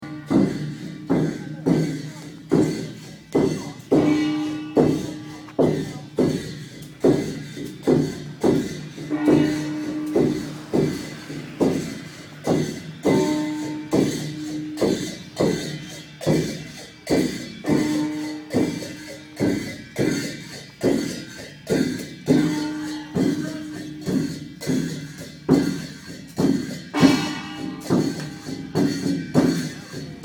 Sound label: monks drumming